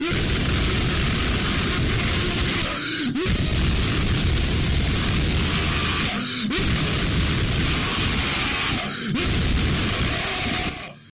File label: mega bass scream